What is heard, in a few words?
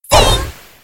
lunge; freak fortress 2; hat kid